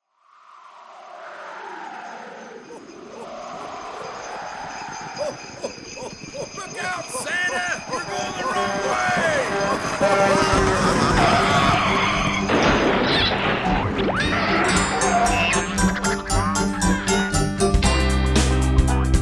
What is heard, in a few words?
studio mix; mixing